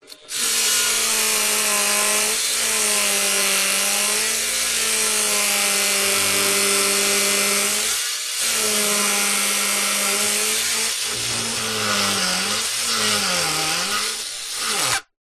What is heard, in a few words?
industrial power tool grinder on metal buzz